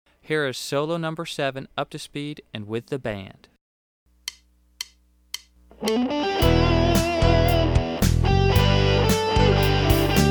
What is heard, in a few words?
Solo Fast